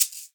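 shaker leg, Synclavier Percussion, World & Orchestral; Synclavier Percussion 2; ILIO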